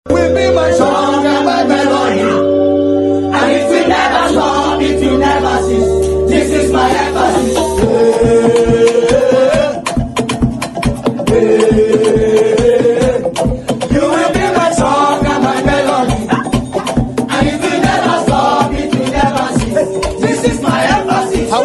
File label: House